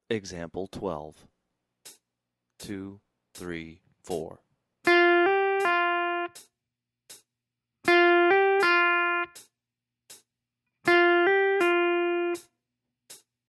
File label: slurs, blues guitar